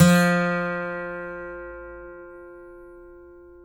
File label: ACGT F3, ACCGTR PICK